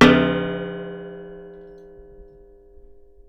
Baking Tray, contact mic, Metalics

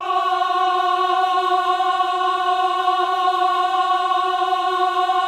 VIB F#4, VIBRATO, Voices; Spectrasonics

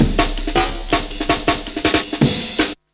Control Amen, breaks; m8 breaks

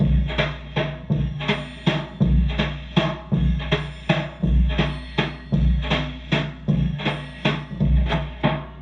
Loop, rhythmate, classic machines

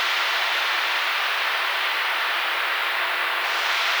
sparse soundscape samples; ReLooped

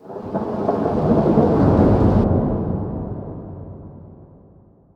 thundershot; Sonic Foundry (Sony Creative Software); Ugly Remnants VOL-2

